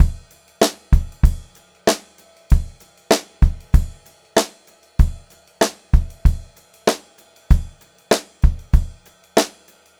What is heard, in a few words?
Pop-Rock Drumloops; 96 POP 3